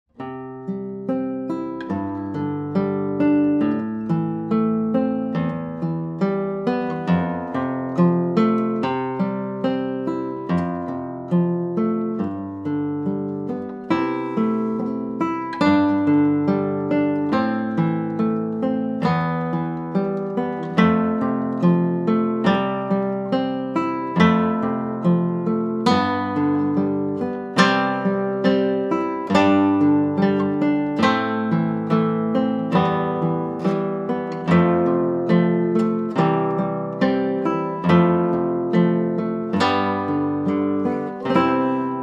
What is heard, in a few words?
Canon in D, guitar